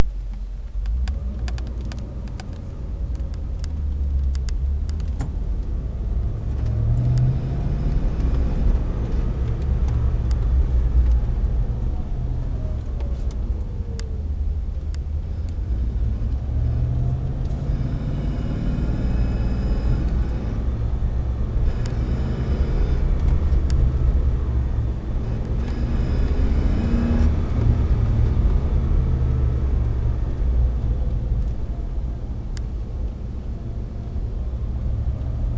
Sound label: noise, scraping noise when accelerating